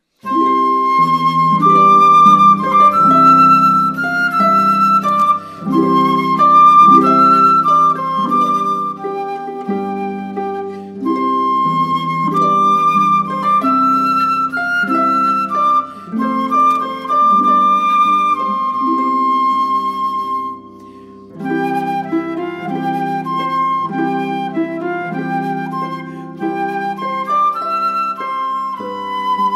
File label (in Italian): Marcia Trumphet Voluntary arpa flauto